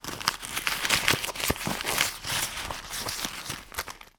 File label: foley wallet purse rummaging receipts